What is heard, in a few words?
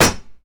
shield hit cut; Mount&Blade Warband